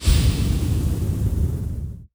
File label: Tentacles Sucking; Radiance